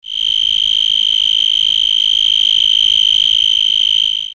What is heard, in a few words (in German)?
Grillen